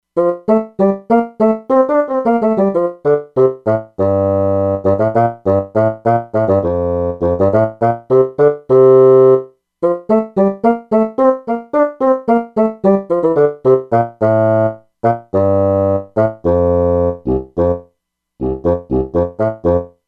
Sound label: Bassoon, E-mu Proteus-2; Factory Presets